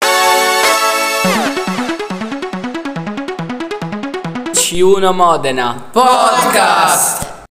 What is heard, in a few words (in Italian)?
Jingle; sapere e salutare